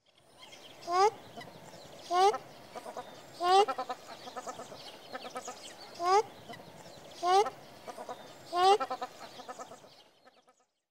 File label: Crested shelduck; پرنده تنجه کاکلی